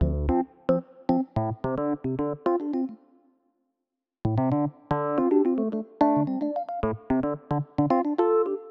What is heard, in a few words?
Electric Piano, Loops, Keys